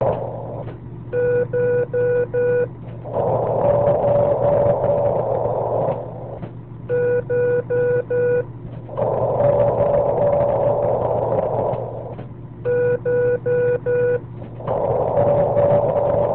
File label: echo; 2m